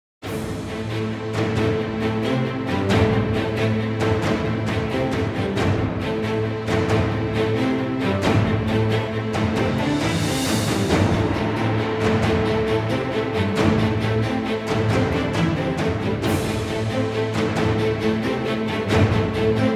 Trailer, Background Music

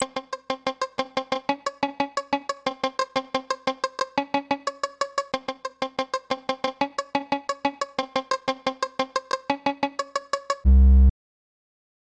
Hip-Hop bit

